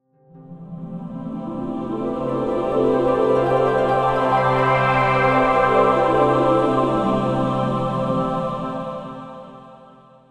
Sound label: cartoon dreamy heavenly glissando harp choir; zapsplat